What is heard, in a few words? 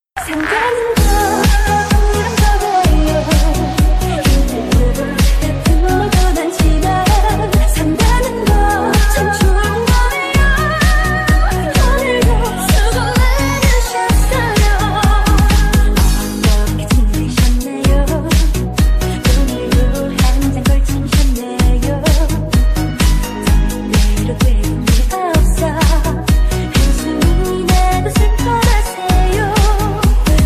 remix tik tok, ringetone